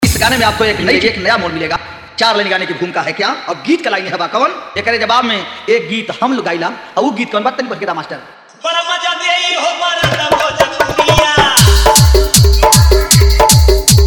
Bhojpuriya Hit Dance Mix Jhan Jhan Bass Tahlaka Mix